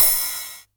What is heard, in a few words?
909 ride tape; Goldbaby Tape808 & Tape909; The Tape 909